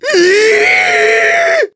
Shocked; Groose; Skyward Sword

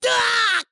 Damage

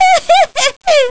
Girl Laugh; Girls laughing

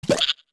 Hop

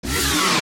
Hit; Gohma; zelda; OOT

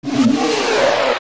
Die; Morpha; zelda; OOT

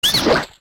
Deku Scrub Hit; zelda; OOT

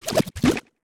Chuchu Hop; Chuchu hopping

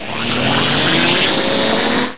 Light Blast Charge; Vaati charging up a light blast

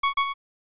Low Health; Zelda; OOT

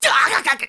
Hurt Electricity; hurt by electricity; Link; Skyward Sword

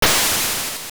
Scent Seed Shot; A Scent Seed shot with the Slingshot or Seed Shooter (also the Pirates' Bell)

